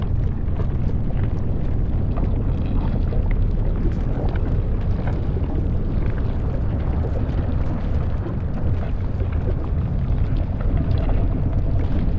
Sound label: void worms nest idle; Stellaris; grand archive; ships